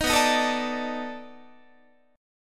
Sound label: B 7th Sharp 9th, down, Guitar; B7#9; strummed; 18,19,18,19,18,20